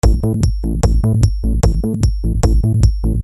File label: loops